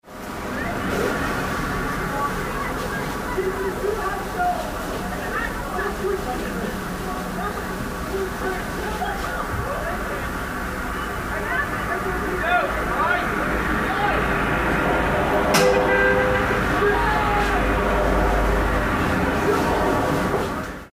leeds freshers week end

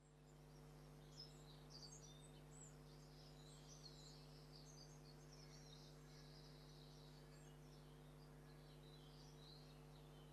stream hum

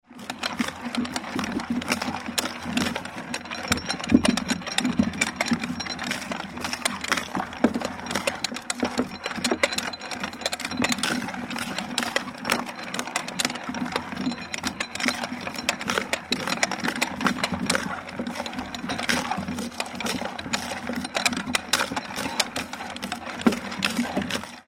cider apple crusher

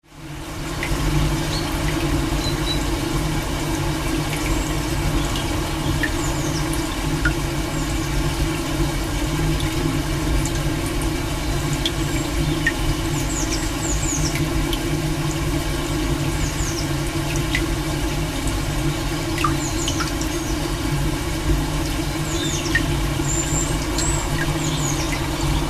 chalice well

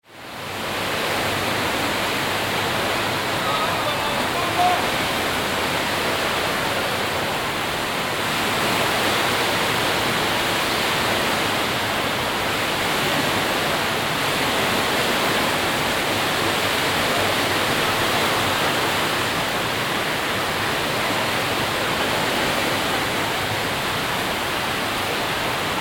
under clacton pier

